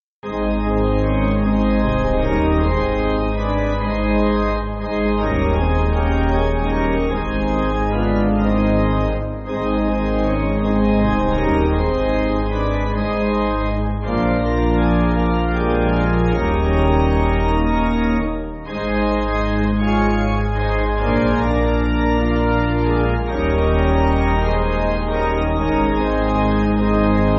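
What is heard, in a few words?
Pipe Organ, Music Backing; I Am So Happy In Christ; Sewell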